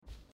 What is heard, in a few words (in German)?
objekt auswahl backpack sound; Life is Strange 2; gamesoundopedia